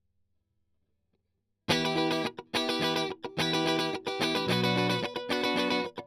Strum

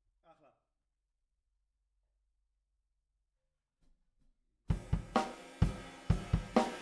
Room

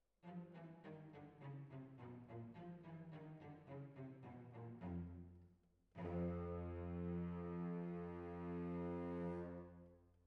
Violins Over

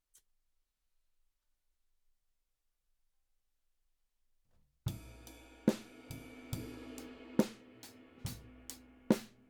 Drums Para

